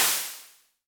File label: Hihat Open Future MPC